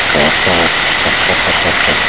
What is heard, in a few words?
Unknown sp Sound